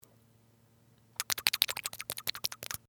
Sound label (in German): schmatzen